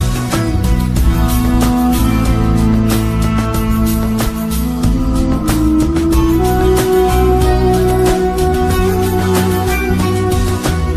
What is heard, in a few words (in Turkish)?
turk sms sesi